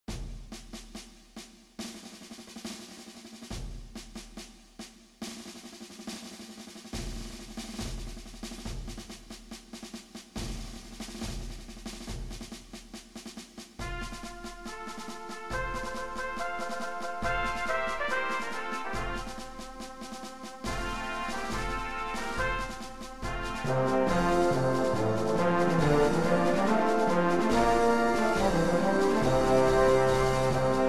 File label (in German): Marsch, F-Dur